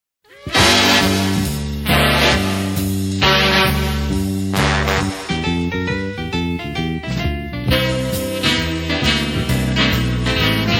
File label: bigband